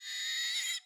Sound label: ambience room butchery pigs scream